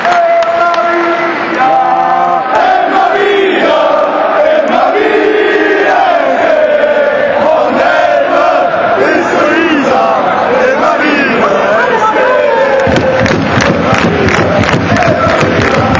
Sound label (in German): gesang; Immer wieder SGE; Stadion